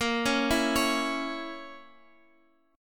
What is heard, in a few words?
A sharp Diminished Guitar Open F, chord; arpeggiated; JGuitar